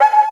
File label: PERC; ZERO-G; Datafile